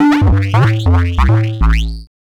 SNTH BASS ELCTR 140 A, Techno

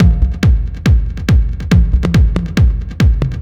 TECHNO BEATS 140

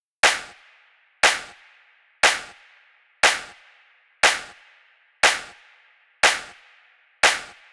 120 Disco Claps; Loops; Touch Loops; CosmicFunk

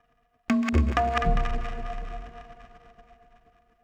PERCUSSN ETHNO 125, Drum

